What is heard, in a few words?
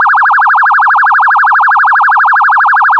Fast Chirp, Transport Road; PFx Brick